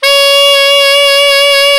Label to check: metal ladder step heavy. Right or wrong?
Wrong